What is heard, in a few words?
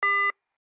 voice busy